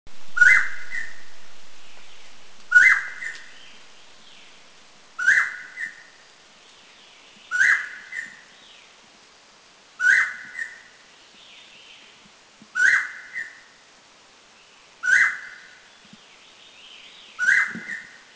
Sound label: Spot-breasted Scimitar Babbler, Taiwan